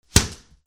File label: OFFICE